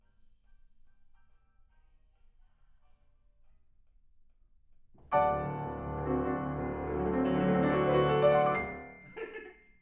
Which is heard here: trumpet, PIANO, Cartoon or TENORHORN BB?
PIANO